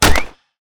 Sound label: mine shoot